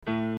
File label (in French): Basse